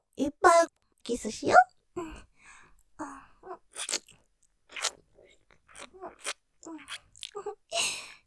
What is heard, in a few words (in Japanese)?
キス; いっぱいキスしよ♪; ループで楽しむ！シェフの気まぐれ抜き抜きコース